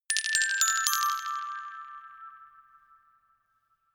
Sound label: notification glockenspiel rattle sequence descend